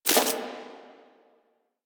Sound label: Screen Glitch